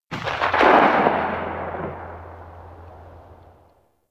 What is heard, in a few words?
Heavy Shotgun Tail B; Warframe; Tenno